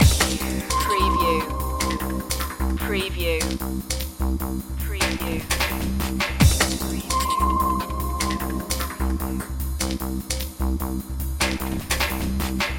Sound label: aviation loop